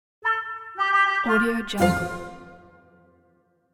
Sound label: laugh from above